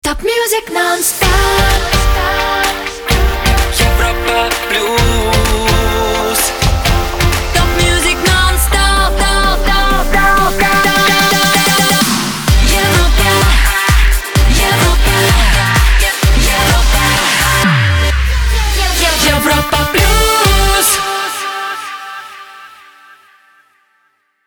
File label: Jingle Main ID; Europa Plus